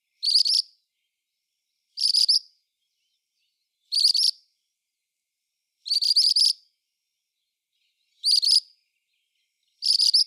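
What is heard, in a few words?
ashy minivet song, birds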